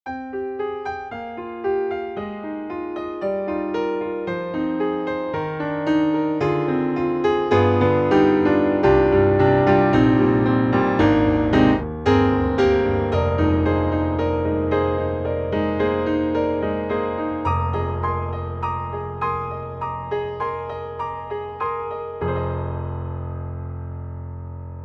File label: Ravenscroft 275 Close Mic; VI Labs